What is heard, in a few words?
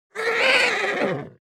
magic horse and loon bird cooing whinnying